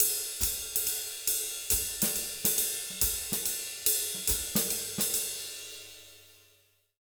Jazz Latin Drumloops, 140; USB Soundscan vol.08; AKAI

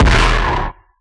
industrial kick; Dr 202; Percussion

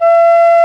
P WHISTLE, Old World Instruments, Winds; E-MU Producer Series Vol. 7 – Old World Instruments

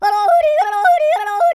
YODEL; ILIO; Vocal Planet

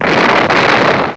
BOMB; M8; CMI Factory

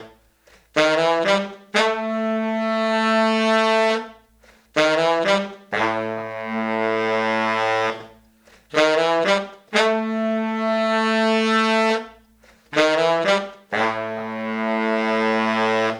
Horns, 70s Analog Funk; Sonic Foundry (Sony Creative Software); What it Is!; Classic Caddy

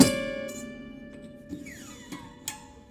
Prepared Piano; Battery 3 Sample Kits

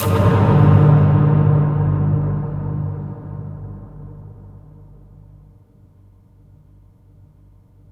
pit hit; Spectrasonic Distorted Reality 1

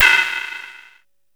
SYNTH STICK; Best Service Alpha Dance vol.2

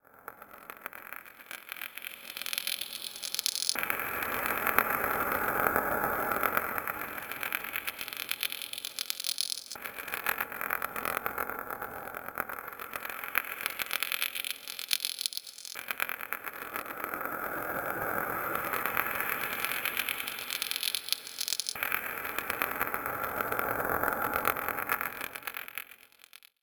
Textural Soundscape, IDM

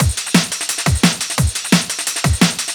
DNB BREAK

